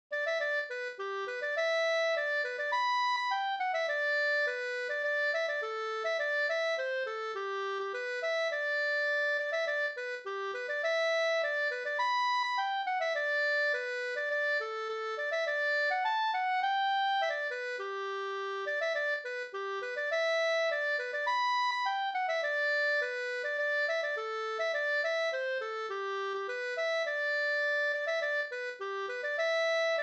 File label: polka; e David Jonsson Grums Vr